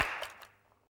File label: effect nailgun; lucius